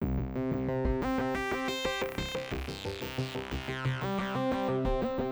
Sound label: Synth, 090 Cmin